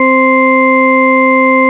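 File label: 4 octaves; SQ80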